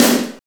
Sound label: snare power; Roland L-CD701; Snares 3; Sn Modules 3